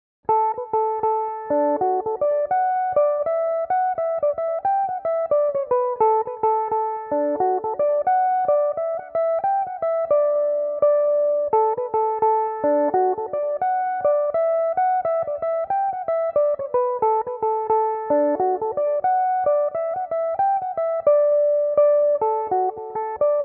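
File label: tom connors jig D major, irish mandolin; Belmuse EM-190 electric mandolin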